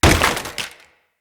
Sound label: lantern crate land; Objects; Ode Lantern Crate